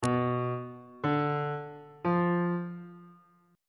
c harmonic minor chord 7 is b diminished on bass clef